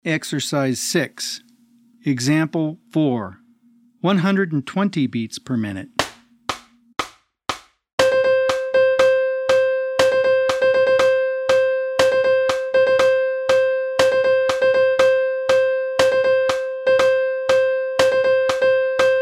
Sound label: 120 BPM